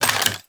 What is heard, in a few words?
Putdown Gun; Warframe; Cephalon Weave